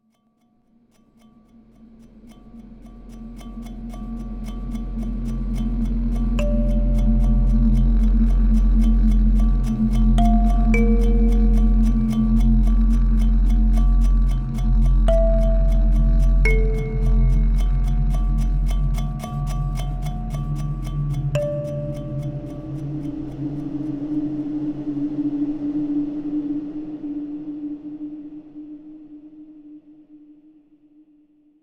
Transition; Ozymandias

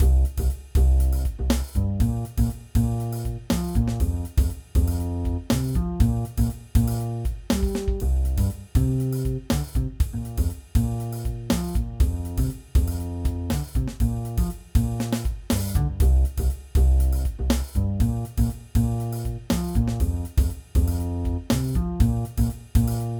BGM rhythm; game builder garage 2021 switch; Node 07

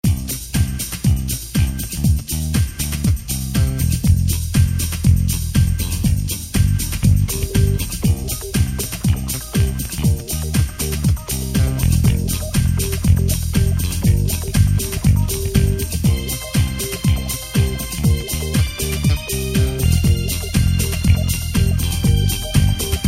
Disco; starshot

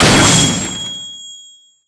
Eggsteroid Break; angry birds star wars 2 android ios 2013

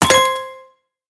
Race Money Pickup; aqua moto racing utopia ps4 switch wii u windows xbox one gamerip 2016

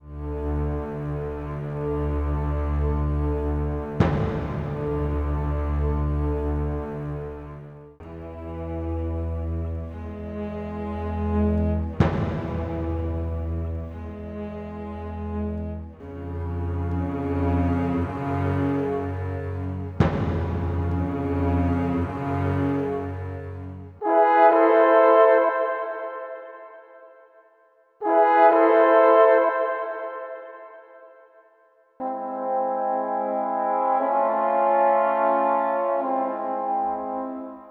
Game Over; Daemon Vector

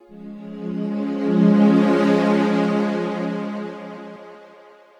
Pad; Dr Watson Pad; Proteus

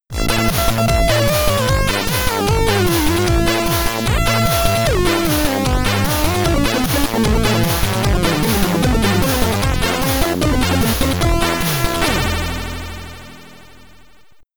Jingle, SID Stereo; Magic Johnson's Basketball; commodore 64

